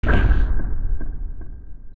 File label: Drawbridge Up Done